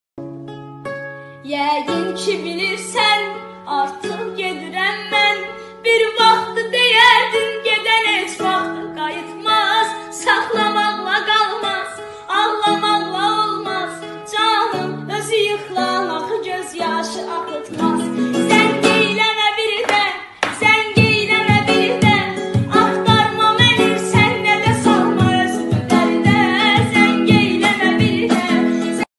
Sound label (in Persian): Sedaye Bache; با صدای زن